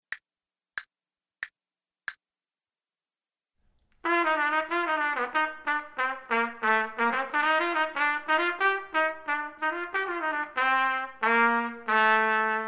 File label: trumpet; Selected Duets for Trumpet Podcast